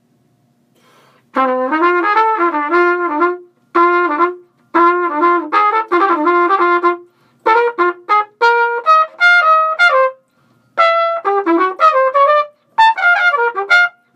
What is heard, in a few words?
Trumpet